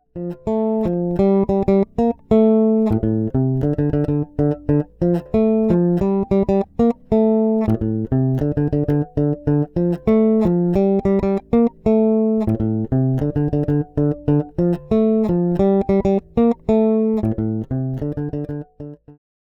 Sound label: Bridge Pickup; MTD 535